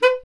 B. Clarinet

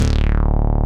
SYN STBAS

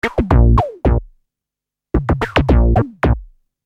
Syn Bass 110 A#, Techno Synth Loops; SynBs; 110bpm; Sonic Foundry (Sony Creative Software); Signals I

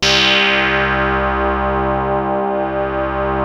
PPG C2; Hollywood Edge - Giorgio Moroder Rare Synthesizer Collection; PPG.2.3

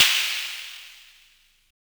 Snare, Univox SR-95

